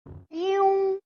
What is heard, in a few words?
Eowwww